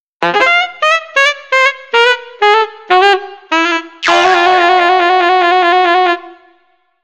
Altosax

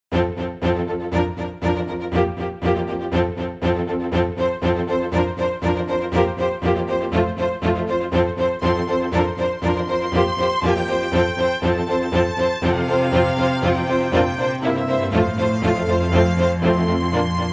Chamber Music; 밝고 맑은

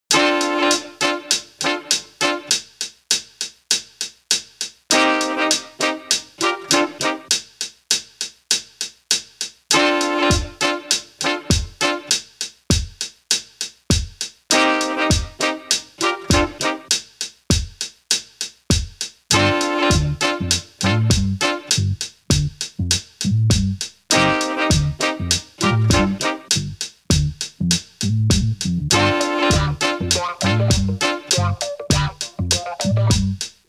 Drum Show; Funky

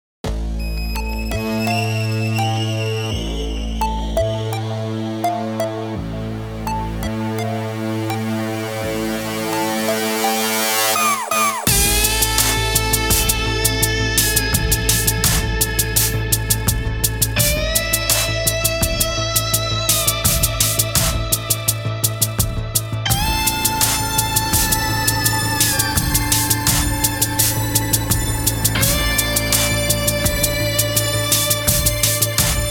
Drone

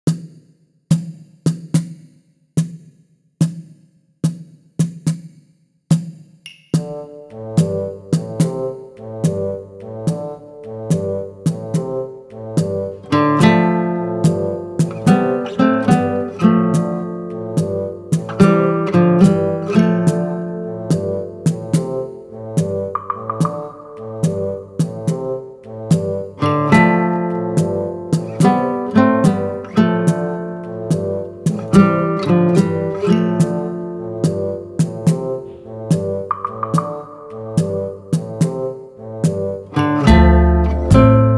Guitar Sequence, Comic Slow; Difficult Guitar Sequence

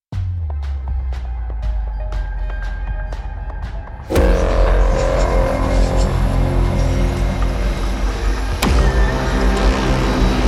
Shadow Tension

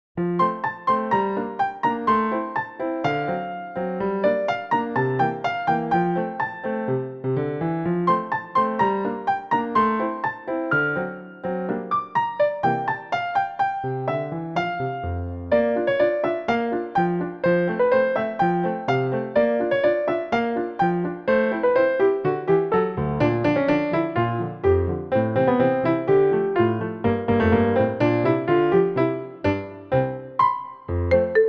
Newage

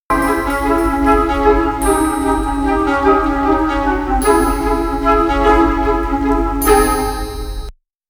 Mystery Whisper

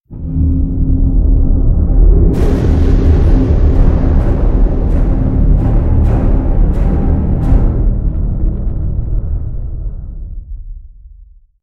Dark Halls, Tension 공포

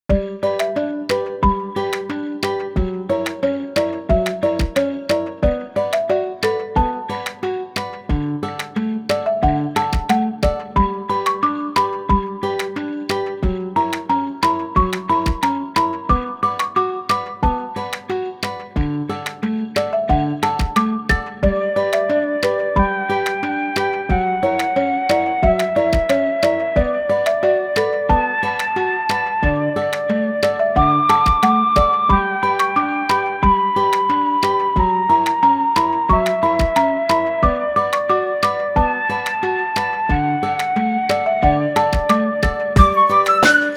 Spooky

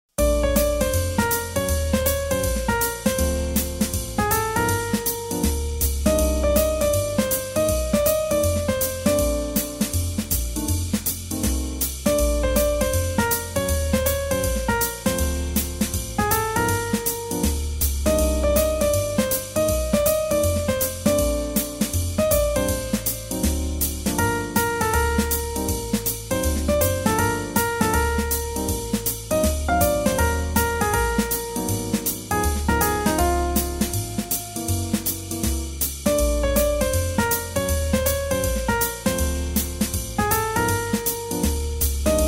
Swing Jazz

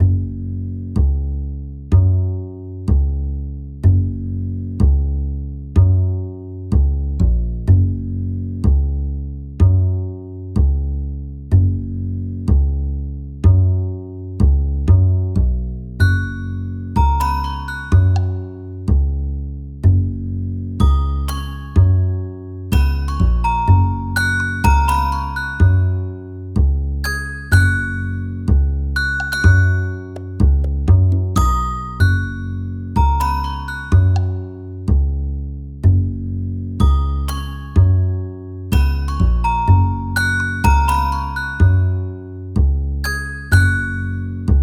TicTac, Comic Slow

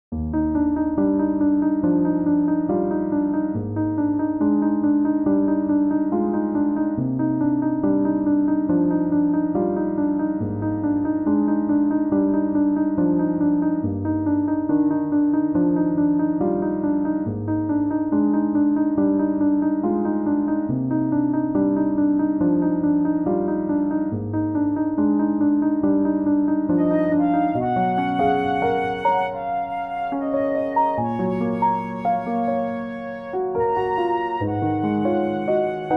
Newage, 감성

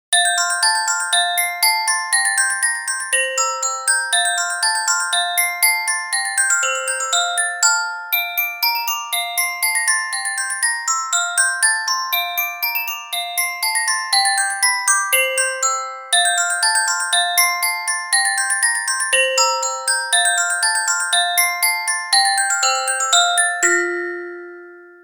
Fast Orgol; KIDS 귀여운

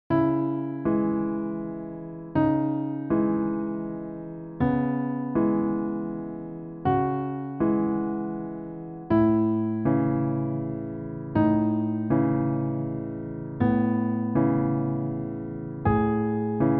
Newage, 감성

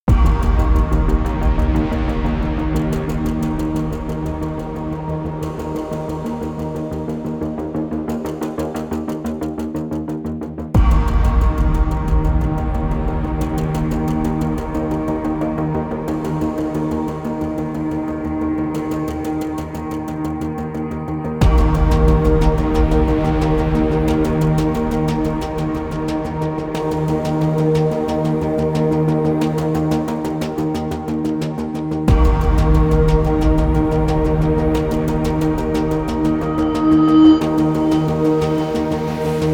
Urgent Time, Tension

